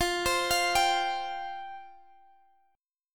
F Suspended 2nd F Guitar Standard; Fsus2; x,x,15,17,18,15; arpeggiated; capo=2

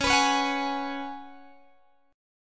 C sharp 7th Suspended 4th C sharp, Guitar Open G

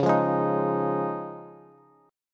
Db Major Add 9th, Bass; strummed; JGuitar

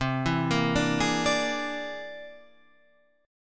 C Augmented 9th

